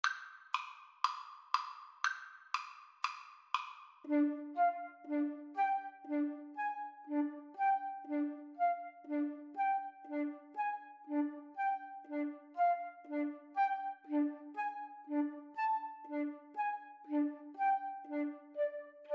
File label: Flute duet